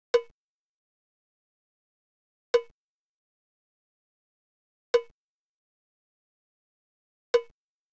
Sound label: Gated Drum Loop - 100 BPM Cowbell; Analog; Cymatics - ANALOG EVOLUTION Beta